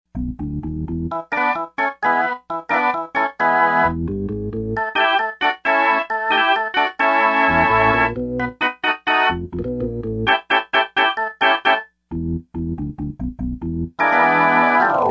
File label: Deep Organ, Yamaha TG55; Factory Presets